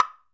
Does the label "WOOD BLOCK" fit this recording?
Yes